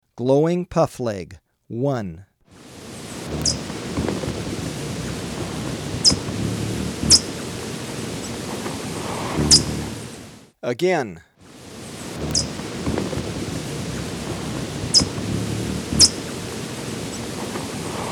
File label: Glowing Puffleg, Birds of Ecuador; S. America